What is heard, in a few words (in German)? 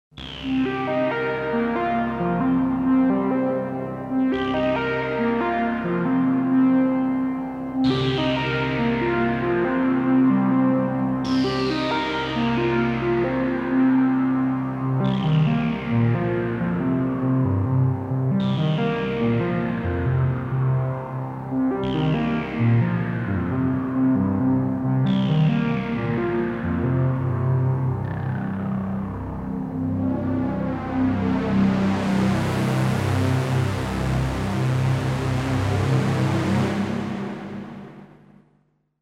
n6 schön analog; tyrell